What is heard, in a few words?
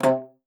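C3, bass flute; Xsample VOL-2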